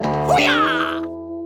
specialmission fight loop

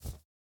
Forest Moth Wings

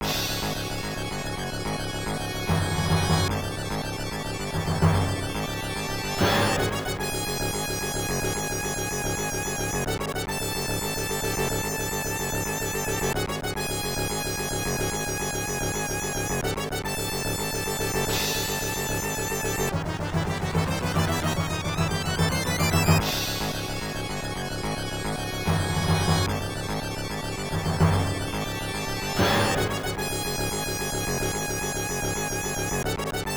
Boss BGM; superman

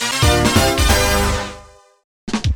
Puzzle Level Up; big money deluxe

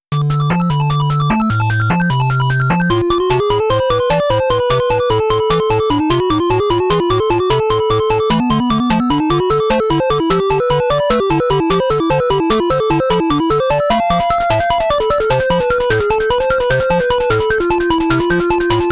Stage BGM; Ninja JaJaMaru Collection Original Soundtrack

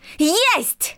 Emote Cheer; the princess and the frog; Twitch Skijump; Geo

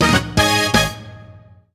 Race Start Fanfare; Time Trials

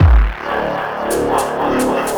Portal boss fill; when vikings attack

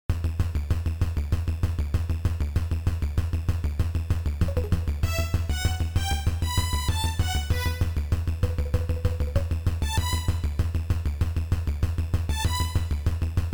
Boss Scene

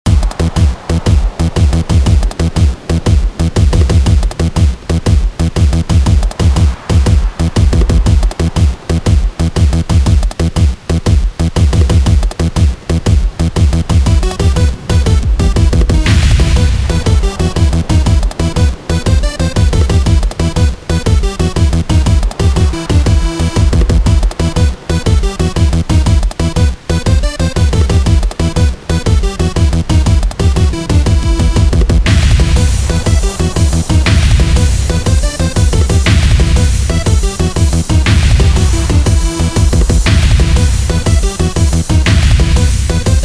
Pirate Jig Full

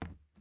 plastic step

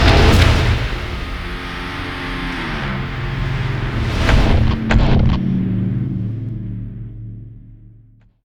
Gameover; Aurora Watching Gorky Zero; Gorky3

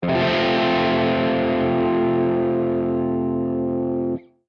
guitar chord; Summer Catchers

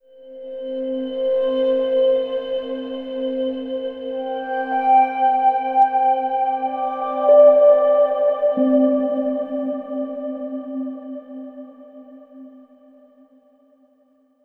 Overlay Tone

